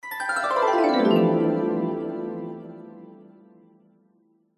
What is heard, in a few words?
fantasy magic glissando warm harp mallet bright descending; zapsplat